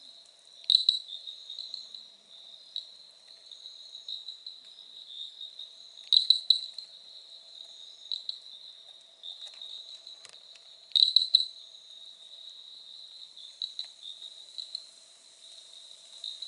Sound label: litoria cooloolensis; Cooloola Sedgefrog